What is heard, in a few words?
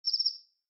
Bird Cicada Notification Evening; Xiaomi Redmi-Note-8-Pro